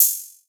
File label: HiHat Trap Open